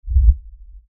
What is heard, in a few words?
Lfe Swell Low Bass Movement; Blastwave; Sound Design